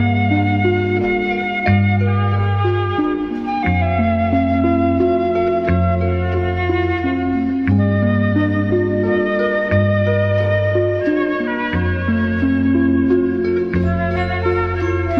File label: flute